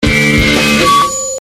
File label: feedback end; Strong Bad's Cool Game for Attractive People